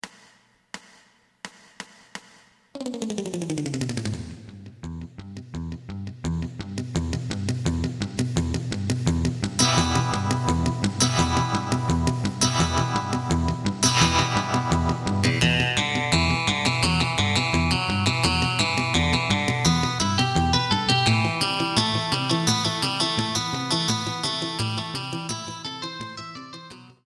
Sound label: drum